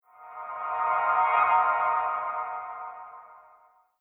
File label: Apple piano